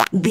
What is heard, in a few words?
fart squirt